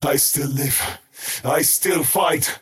skywrath crown attack pain; skywrath mage

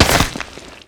attack; weapons; dota; nightstalker

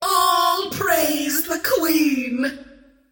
scream great; queenofpain

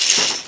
chains, movement; lifestealer

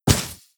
arrow split; weapons; dota; medusa

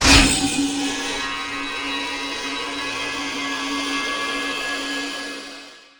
dream coil; weapons; dota; puck

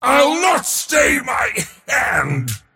cast pain; dota; mars

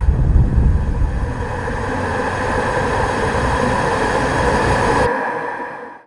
dark rift cast; underlord

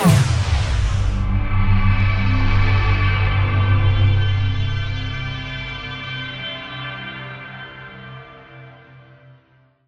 repel; weapons; dota; omniknight; ti8

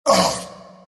arc pain; Zuus

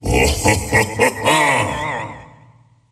laugh; skeleton king

